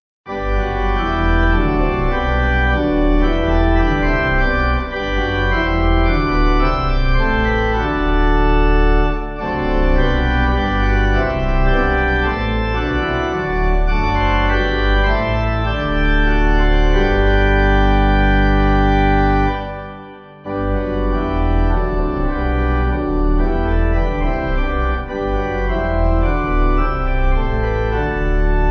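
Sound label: Pipe organ, church music backing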